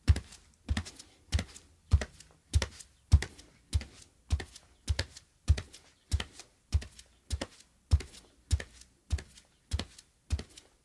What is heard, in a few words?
Bare Feet MED WALK; Joshua Reinhardt; Ultimate Bare Feet Expansion